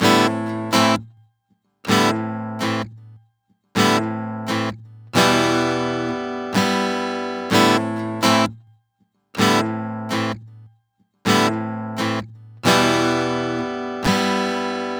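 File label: Guitar 128 BPM A minor; Guitar Loops; Ghosthack; Ghosthack Advent Calendar 2021; Crow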